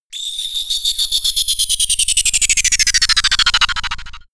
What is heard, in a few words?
spinning ufo